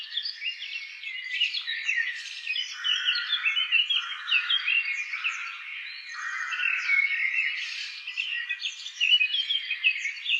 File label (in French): Oiseaux Gueret